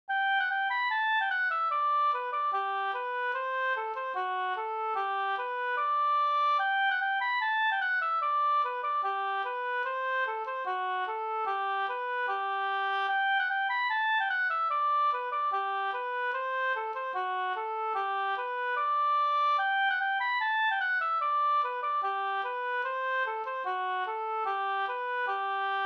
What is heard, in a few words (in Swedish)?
schottis; e CA Hakberg Vg